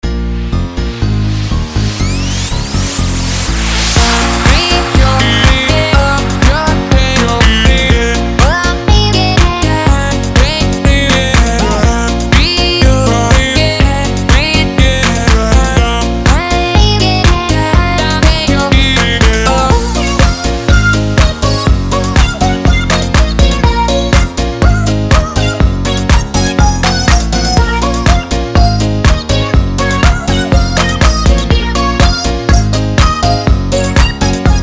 chiptune house

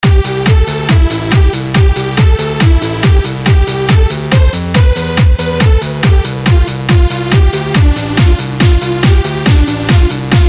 Techno Mix

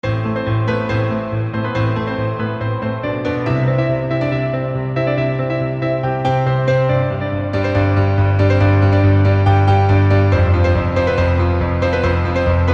piano with velocity

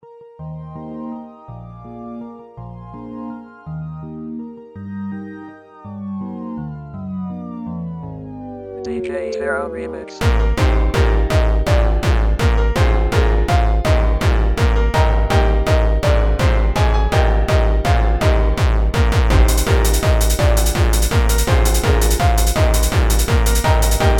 Hardcore

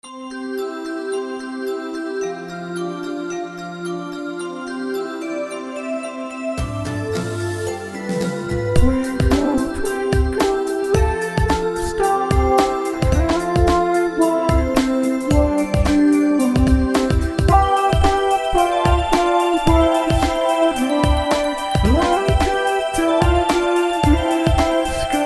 autotune twinkle

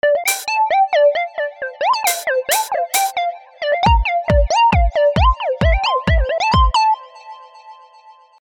jump

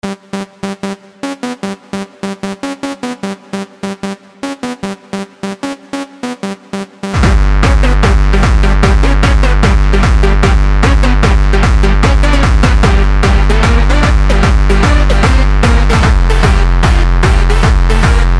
hardstyle realstyle

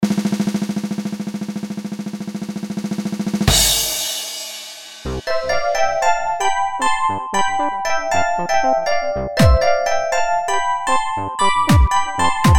Techno

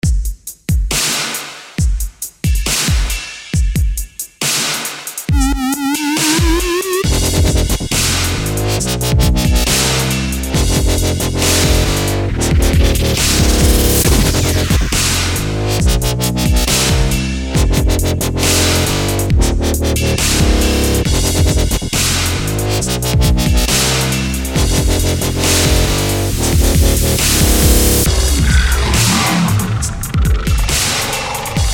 fake dubstep

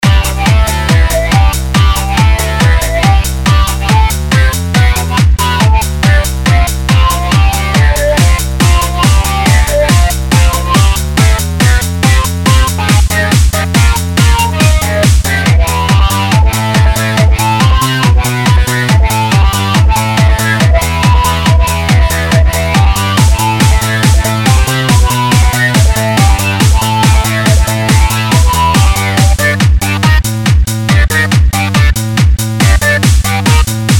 Techno Mix

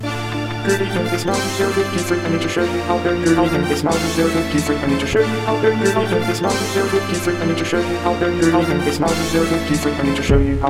play the organ

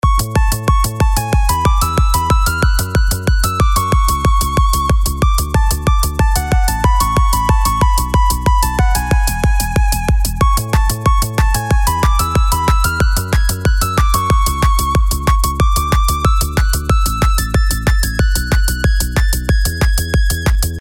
mod melody yay

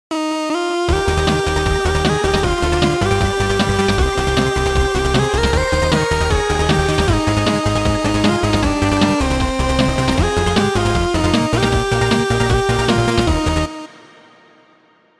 theme chiptune

